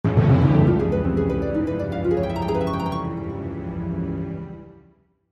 Hits Orchestral, Hits Orchestral Harp Arpeggio Mysterious Dark; Musical; Blastwave